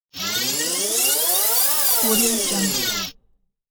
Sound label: tape rewind